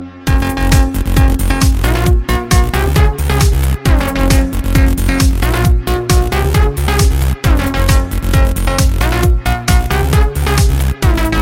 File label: electro music ringtone